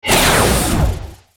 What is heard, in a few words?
Shield; Shao